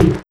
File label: Kick, Kit; Sonic Foundry (Sony Creative Software); Esoterik Beatz; Annoya